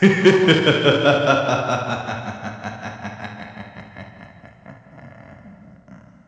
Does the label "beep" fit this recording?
No